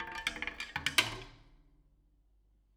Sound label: Bicycle Spokes